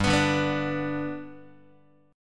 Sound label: G Major down Guitar; G strummed; JGuitar